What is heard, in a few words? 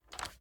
inspect move, reloads; gmod; iw8; xmike109